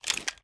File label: drop down, reloads; gmod